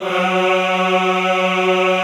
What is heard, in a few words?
EHH F#2, Classical Choir; Best Service